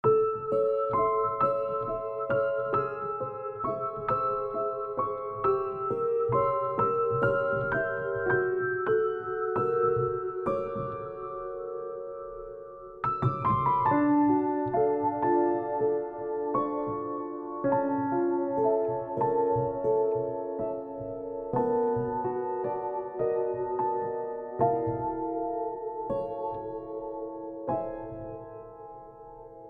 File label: Lullaby